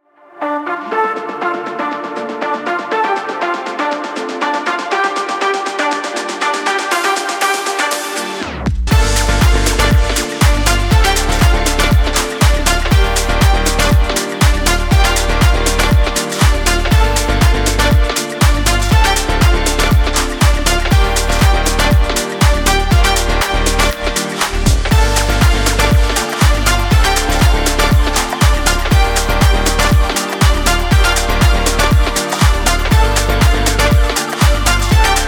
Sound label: uplifting feel good dance